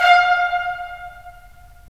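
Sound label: brass trumpets staccato, right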